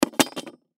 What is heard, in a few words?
Machete Drop Wood Surface; Knife; Knives; Combat; Warfare; Blastwave